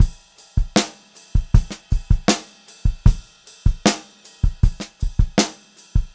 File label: Funk-Groove Drumloops, 78 STRVAR